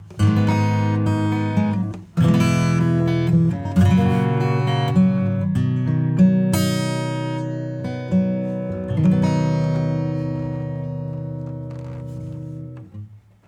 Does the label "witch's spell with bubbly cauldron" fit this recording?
No